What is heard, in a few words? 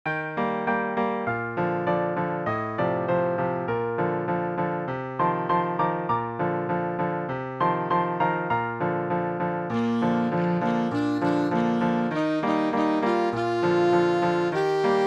solo and piano; green rose